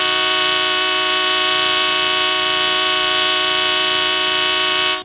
HORN

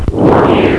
ROCKET

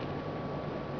CROWD

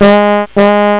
CAR HORN

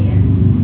saucer; ParadroidII

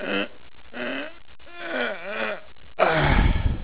UGH; swbreakthrough

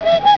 TOY HORN

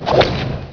SLICE; CLICKS

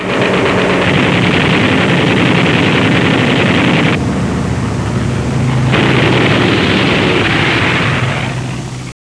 gunfire; warbirds

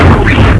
PHASER

